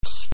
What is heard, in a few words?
Spray Liquid; Bunnicula - Crop Control